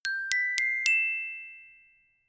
notification glockenspiel dry sequence ascend

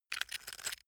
stone scrape short hard; natur-tiere; steine

